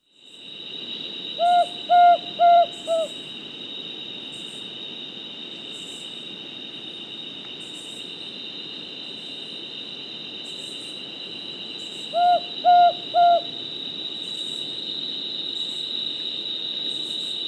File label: song, call, alternate song, Birds of the Costa Rican Cloud Forest; N. America; Natures Songs